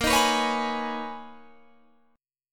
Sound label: B Major 13th Bb, guitar